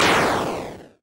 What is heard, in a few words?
ball hits hole, golf